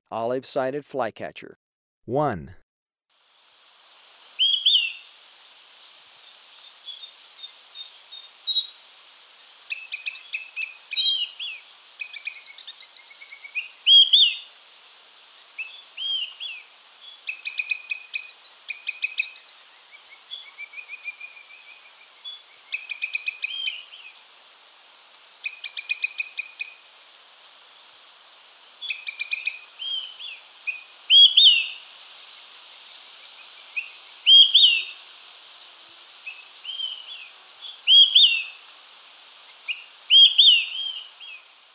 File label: Olive-sided Flycatcher, Birds of Ecuador; S. America